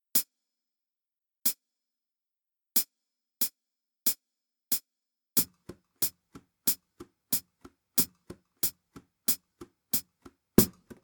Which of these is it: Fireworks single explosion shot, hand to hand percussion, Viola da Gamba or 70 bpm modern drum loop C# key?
hand to hand percussion